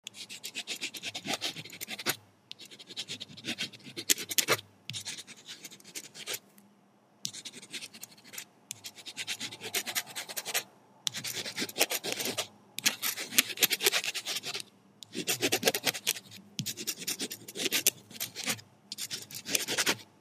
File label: Writing with a ballpoint pen